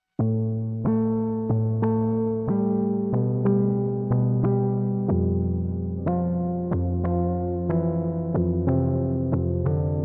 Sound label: obx8 editor test